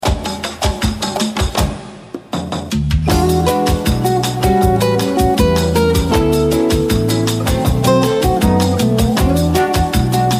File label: bossa bahia